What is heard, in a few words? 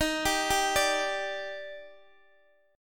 Eb Major 7th, Guitar DADGAD; x,x,13,12,10,12; down